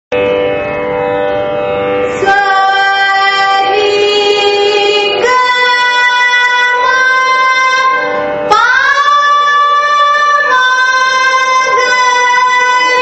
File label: tarasthayi; carnatic music